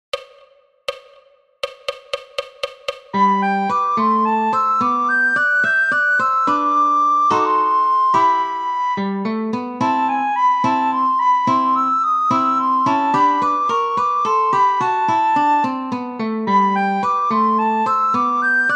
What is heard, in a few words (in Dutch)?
Andante sopraan snel; Carulli; Flierefluiter 7